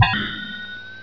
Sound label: Connect; SonicTheHedgehog